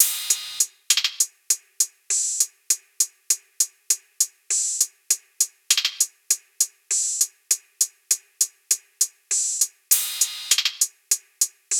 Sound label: SEISMIC hats 100; Hat Loops; drum sample pack; Mahawu; Slate